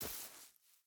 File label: footstep boots grass walk; Rust